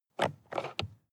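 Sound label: sports car interior compartment open; verkehr transport; autos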